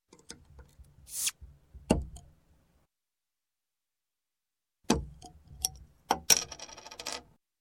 Bottle, Soft Drink Open With Opener; Sound Ideas 2000 Series

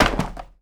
Book Drop Wood; SFX Kit; Foley - Misc

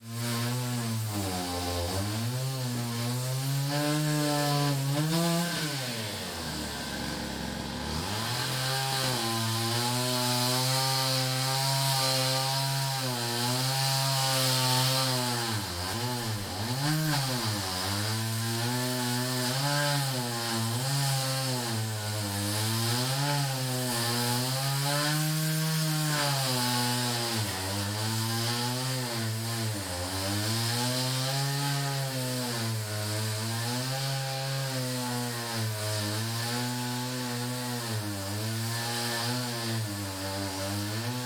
chain saw in action; devices accessories utilities; NS Studios - ultra sound collection

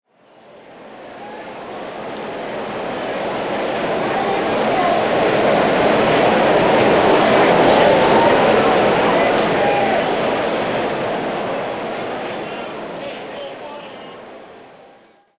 Crowd Ambience; Pitch Black Audio Pong

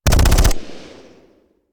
Machine Gun; Sound Ideas; Guns

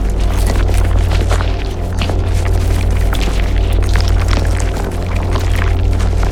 Enemy Earth Spell Travel Loop; AudioWizards

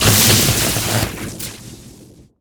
Scifi Forcefield Destroy; Energy & Forcefields

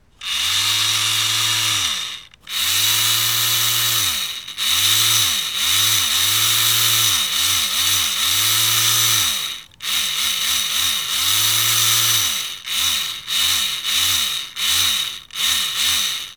Motor Servo Small; Home & Office; 1001 Sound Effects

